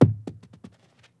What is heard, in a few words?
frag bounce; The Sum of All Fears